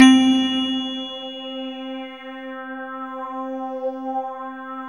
SYN, Wavestation; Sound & Vision - Gigapack I CD 2

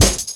Ezee Rolla Cut, Drum Loops; Zero-G Jungle Warfare 1, 2 & 3

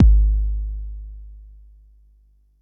Bassdrum, Jomox Xbase09

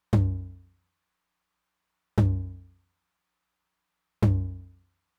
Low Tom, Roland TR-909

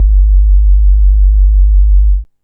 sub; Drum&Bass; Instrument Samples

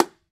E BONGO, World Percussion

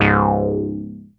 ARP 2600 C#2, synthesizer; Hollywood Edge - Giorgio Moroder Rare Synthesizer Collection

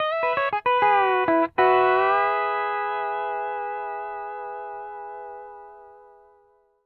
R, Whole Lotta Country, ENDING105

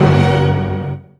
CLASSIQ HIT, FILM TOOLS; Q Up Arts; Dream Experience

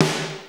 Fairlight CMI III Snare, Drum Machines

